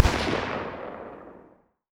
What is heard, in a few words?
combine mg distant; weapons; Half Life Alyx